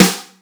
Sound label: short steel snare drum sample f key; snare drum samples